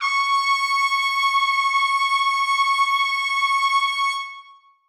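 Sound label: trumpets sustain c#6; Sonatina Symphonic Orchestra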